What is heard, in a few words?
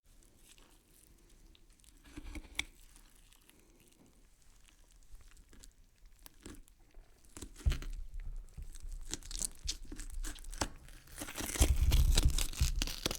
cutting fresh meat